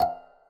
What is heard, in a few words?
synth